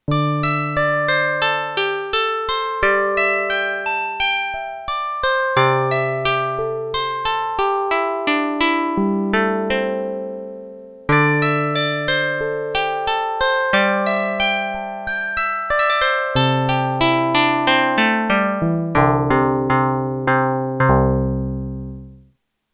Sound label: KOTO, Korg DW-8000; Factory Patches